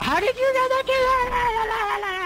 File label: freakout